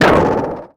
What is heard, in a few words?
Menu Select